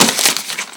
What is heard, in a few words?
paper; Call of Duty Modern Warfare